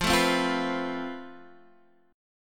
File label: F Major Add Sharp 11th, guitar chord; strummed; Fadd#11; JGuitar